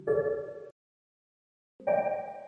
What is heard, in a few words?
queue